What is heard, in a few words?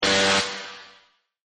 Klaxon, Single Klaxon Blast; Alarms; Electronics